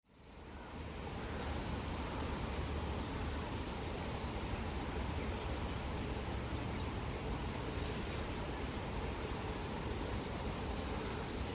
swans taking off grundisburgh

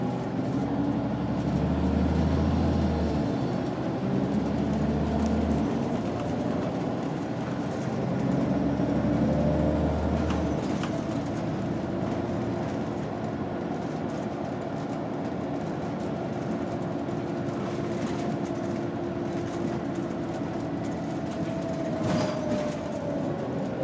empty bus to leeds ambience

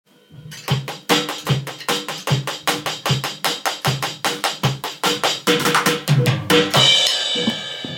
drum tune

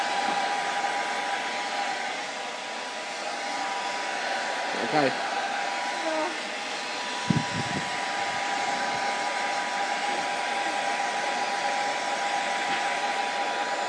sound of drying my daughters hair after bath

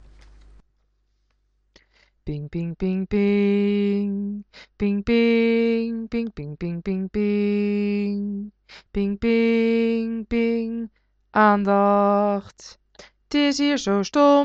ping

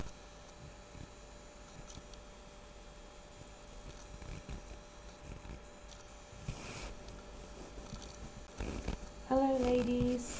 hahaha

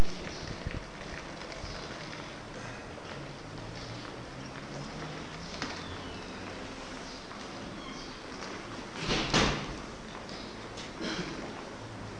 waiting at selby train station

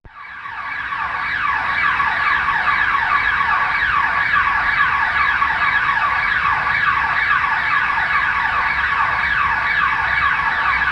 intruder alarms after power cut south london

